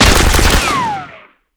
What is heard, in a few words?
Gun Scale, Damage